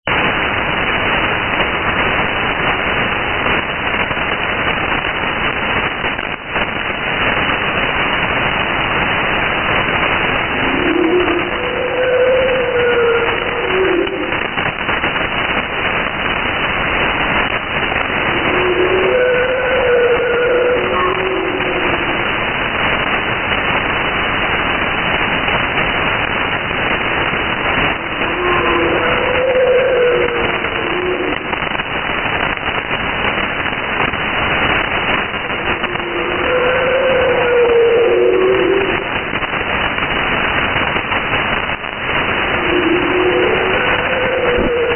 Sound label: Spooky Noise